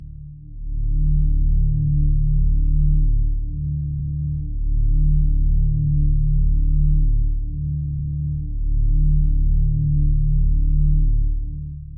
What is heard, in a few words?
logo loop